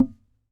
SAZ TAP; Spectrasonics; Hans Zimmer Guitars VOL-2

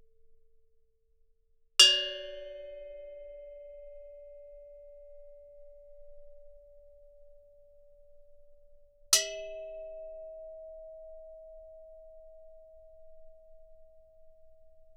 Gamelan; Propeller Island - Complete Gamelan

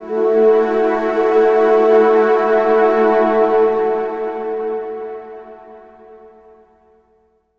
Orchestralic, Pads; Sonic Foundry (Sony Creative Software); Modular Electronica

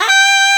Shenai G4; Planet Earth; Digital Sound Factory Planet Earth

